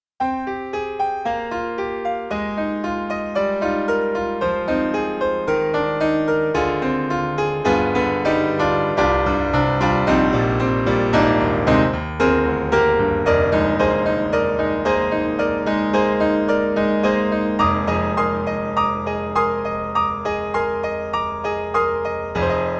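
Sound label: Bosendorfer 290 Boogie Woogie, piano; Steinberg The Grand 3; Boogie Woogie Me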